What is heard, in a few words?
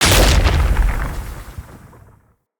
Ground Slam Impact; Enemy; Abilities; Nimrod